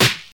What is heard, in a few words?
hip hop snare drum b key, snare tuned to the B note